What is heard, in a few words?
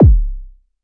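mbrute kick, Arturia MiniBrute; DRUM MACHINES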